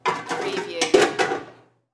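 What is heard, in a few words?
Kitchen Pots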